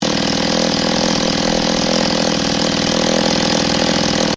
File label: Chainsaw: petrol chainsaw engine ticking over at idle speed; Machinery; Machinery Sound Effects; Stuart Duffield